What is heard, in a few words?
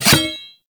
Glaive Catch; Infested; Zealoid Prelate